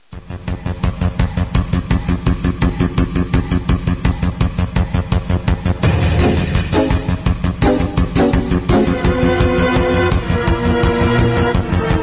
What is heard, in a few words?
hurry; ze ffvii cosmo canyon v5r; cstrike